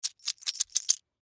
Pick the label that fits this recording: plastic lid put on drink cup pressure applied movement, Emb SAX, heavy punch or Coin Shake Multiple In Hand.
Coin Shake Multiple In Hand